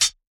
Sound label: Closed Hat